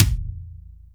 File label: Kick, Akai MPC500; Toolkits